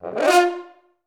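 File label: french horn F3; Best Service; Peter Siedlaczek's Advanced Orchestra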